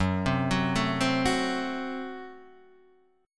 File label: F sharp Diminished; F#dim Chord {2 3 4 2 1 2} arpeggiated